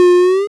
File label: Quad Damage Collect; gamerip; Bullet Party